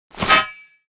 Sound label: shield out; weapon